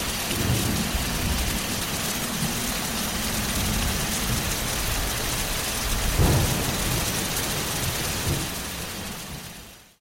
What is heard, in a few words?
storm; soundscrate